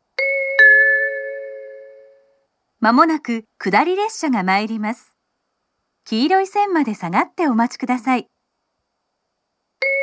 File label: uguisuno kudari sekkinn; 下り接近放送; 田沢湖線 角館・田沢湖・盛岡方面 大曲方面